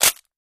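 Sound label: Plastic Crush Short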